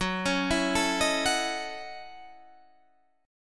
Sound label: F sharp Minor 13th, Guitar, Standard; arpeggiated; JGuitar